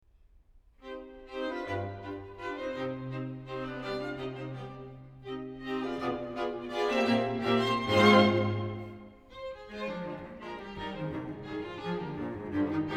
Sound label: String Quintet in C Minor, Menuetto (Quasi allegro); Op. 104; Ludwig van Beethoven; Beethoven - String Quintets Op. 29 & 104